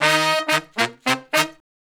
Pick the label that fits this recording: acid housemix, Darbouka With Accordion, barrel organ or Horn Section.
Horn Section